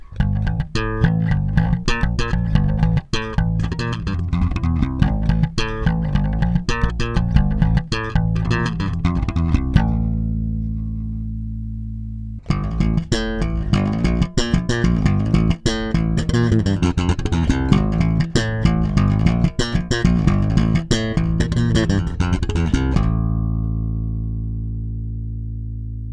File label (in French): slap, basse; A6 first generation; comparo first & new generation